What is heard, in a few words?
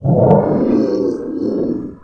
scrm; The Elder Scrolls III - Morrowind